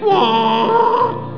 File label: moan